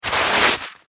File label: Snowboard Slide Stop; Sports; Skiing and Snowboarding